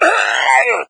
sight, hazmat zombie; doom3

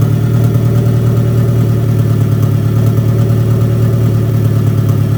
simulated vehicles idle

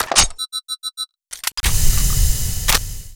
heavy laser vent

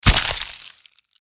sting attack; npc; cazadore

